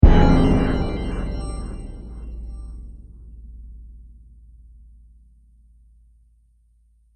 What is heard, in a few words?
Trailer Hit Scifi Data Fallout; Trailer Sound Effects